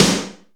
Reverb Kick Snare; PS-37 Dance Synths; Percussion

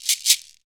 SHAKER, Afro Shakers, Shake&Rattle

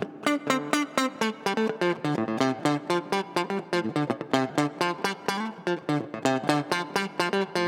Guitar, 125bpm Dm, Nu Disco; Zero-G; Construction Kits; Speedy

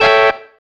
Stab, Organ, One Shots

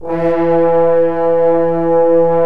FRENCH HORNS; OMI - Universe of Sounds

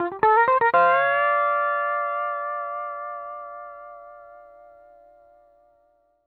PD LOOPS 120, Whole Lotta Country

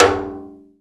rim Timbales; E-MU Producer Series Vol. 2 – More Studio Essentials; Composer; Timbales Xswitch

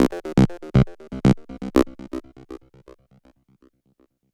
Quack Seq; Dr. Fink's Funk Factory

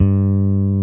Basses, Roland

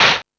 Percussion

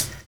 SERIAL BD; Univers Sons

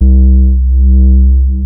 C3, BASS; Q Up Arts; Dream Experience; BASS BANK 6

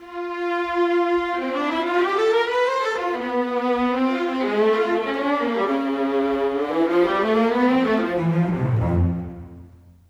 Bass, Cello Viola, Orchestral; Sonic Foundry (Sony Creative Software); Orchestral VOL-2; Modern 29

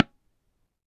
"Ti", tabla; Mango Loops

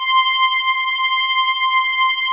OBX PADS; Pro-Rec - Synthsonic; Z1 PADS

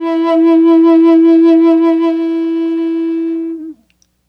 Native Flute, Native American

